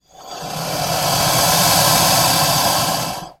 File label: Alligator Breath. A Long, Deep Breath. Close Perspective.